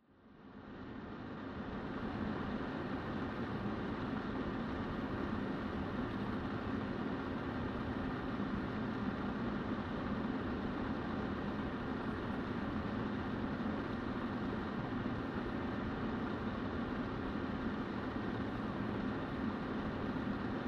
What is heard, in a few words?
PC Operating Noise Long